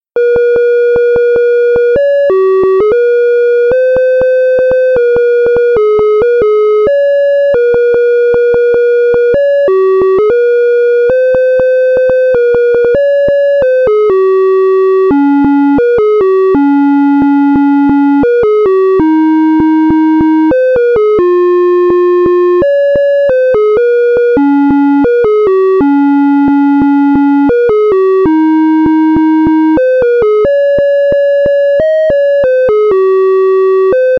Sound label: Whirlwind Computer Jingle Bells; Guy Fedorkow; MIT Museum